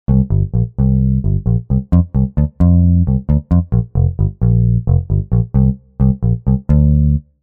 Motown Bs; TURBO VL

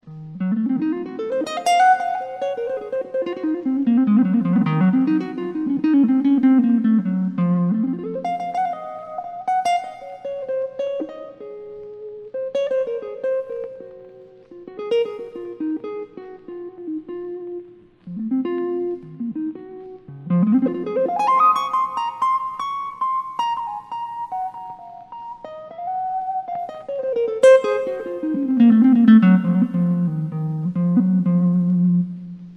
A Guitar

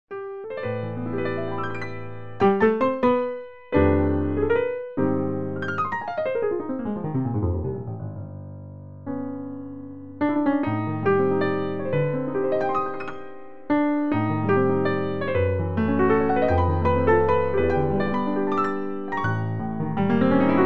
Mega Piano

